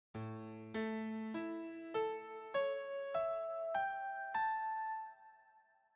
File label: harmonic series